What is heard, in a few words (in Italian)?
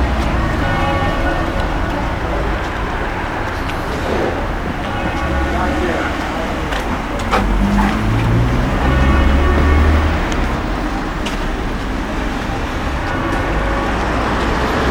campane di mezzogiorno; ecomuseo